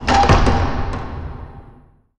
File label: Door Large Wood Unlock; Doors